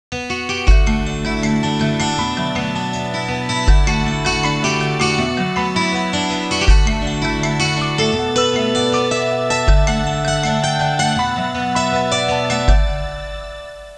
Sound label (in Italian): suonerie musica hawaiian